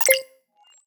UI, HUD, Short, Terminal, Beep, Lofi, Reto, Scifi, Menu, Action